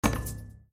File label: offer acceptable